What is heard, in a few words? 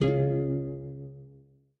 lyre strum; smite; Apollo